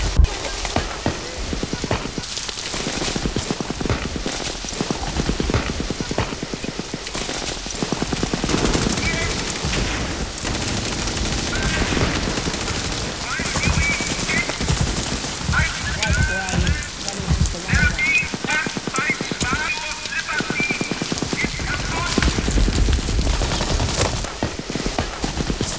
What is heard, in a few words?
thunder rain